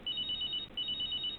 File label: nokia 1610 ringtones ringtone